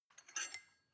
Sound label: weight bolt pull out; sport freizeit